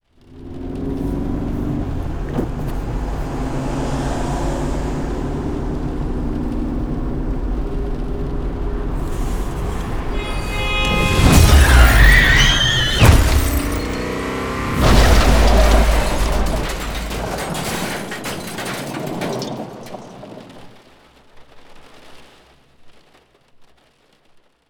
Glitch Crash No Voice

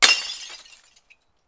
bottle break

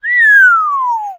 parrot

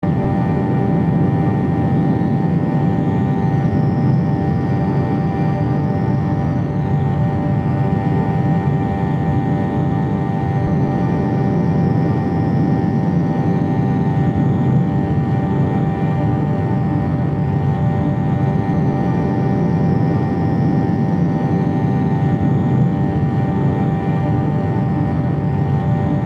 bgm dark drone; story

